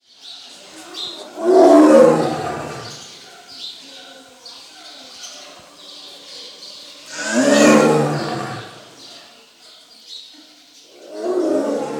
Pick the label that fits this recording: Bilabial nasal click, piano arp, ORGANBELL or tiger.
tiger